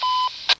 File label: voice off